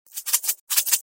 Salt shaker shaking; cooking

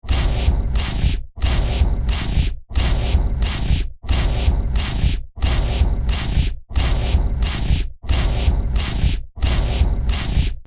Mechanical movement with air release loop; steampunk; gfxsounds

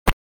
Cartoon splat air squeak

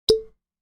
Cartoon cork pop

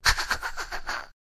Goblin creepy laugh

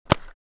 Paintball gun single shot; sport

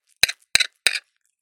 Dinner or lunch scraping the bottom of the plate

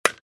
Baseball hit aluminium bat

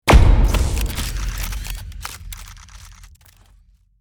Stadium spotlight hit arena entrance

